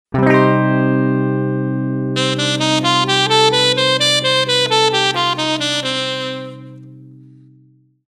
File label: jazz minor, sax